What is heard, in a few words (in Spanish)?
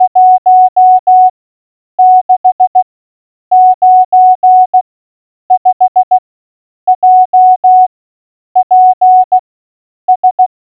Generador Morse CW